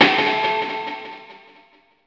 Citizens; murasaki baby; ps vita

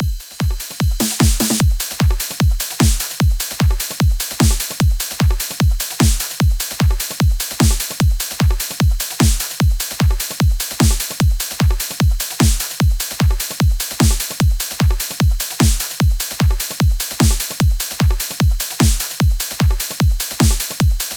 Tutorial Click; Gunvolt Records Cychronicle; PS4; Xbox Series X|S

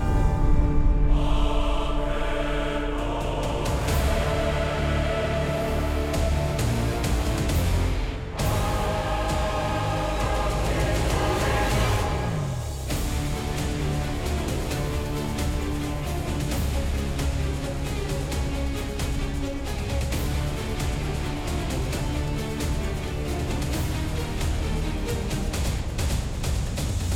Battle Boss; Igris Phase 01

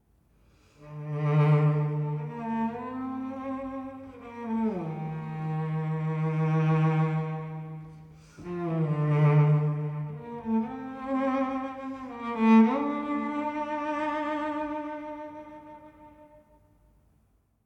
Savannah Music

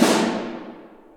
Snare

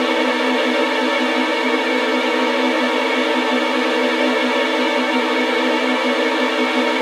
Violins Trill; gamerip; shu; ps vita ps4 switch windows

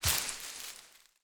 foliage hit

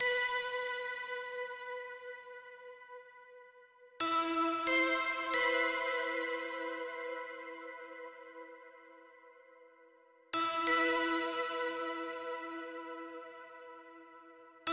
Bell layer 8 Bars; vostok inc. switch gamerip 2017

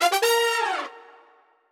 Bravo Jingle; Nope Quiz

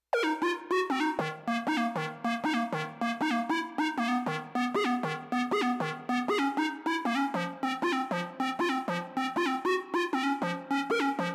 Melody; chippy

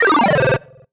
Solo Chip Square Negative; MUSIC EFFECT; startide

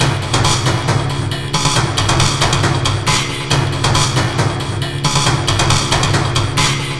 Short Loop; Star Gods

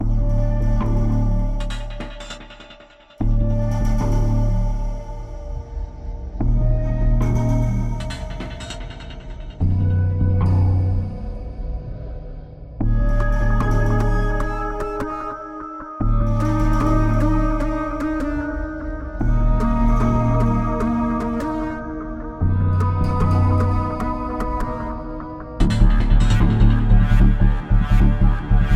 Run Or Die No Drums Stereo

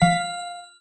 multiplier off

